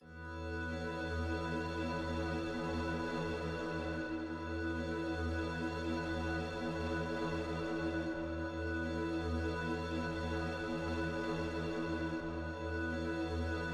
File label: glass pad